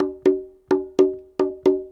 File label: PERC LOOP ETHNO 125